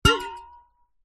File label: bottle metal drink containing water bash against table; food drink; zapsplat